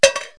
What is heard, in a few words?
bolts; Wired for Sound Pro CD; Aristosoft, Inc.